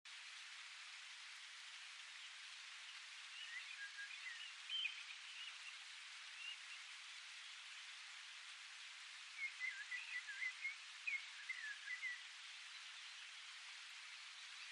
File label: nature sound; brasil